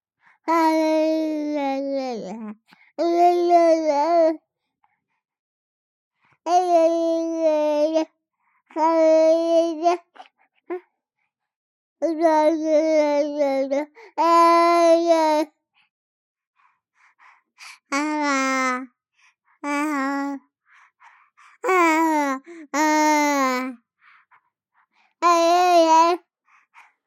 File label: BABIES BABBLE Boy 3 months Mumble; Baby Boom